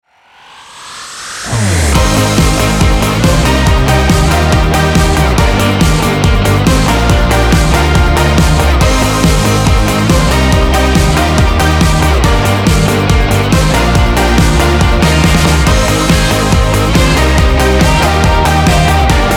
Score Pop Rock; Der Lehrer

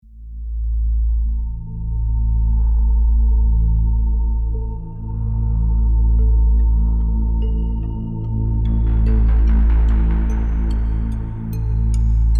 Character Trailer Music; Mortal Kombat X

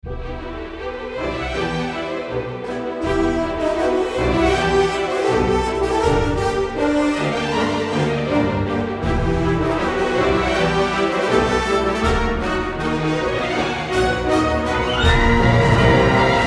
Action Symphonic Strings

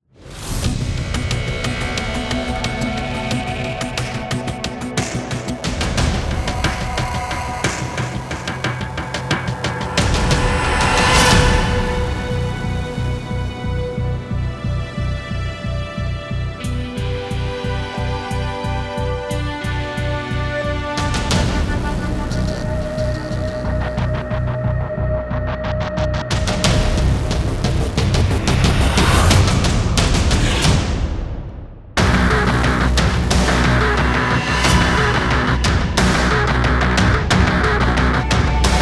Action Electronic

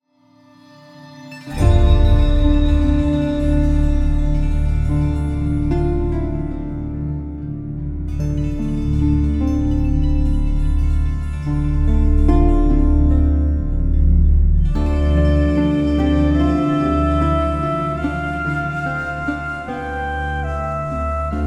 Breton Lullaby

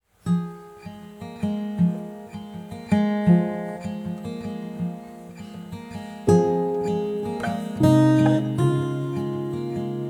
Score Acoustic; Bibi; Der Lehrer

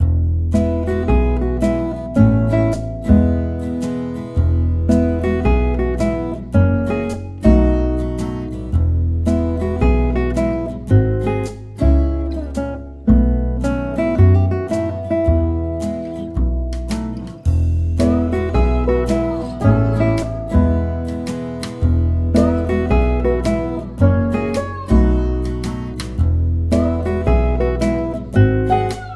Background Country live; Bigfarm

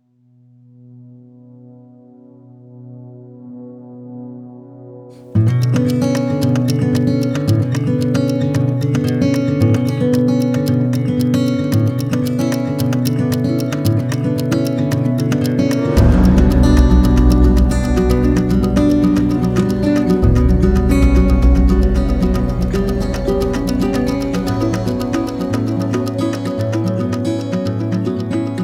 Dramatic Folk

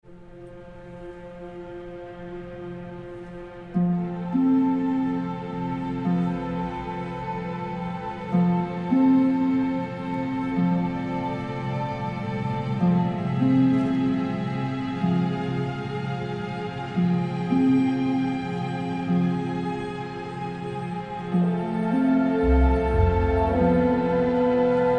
Fantasy Ingame Orchestral; Gothic 4

